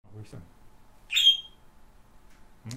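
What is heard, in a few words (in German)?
kontaktrufe altvogel, wellensittich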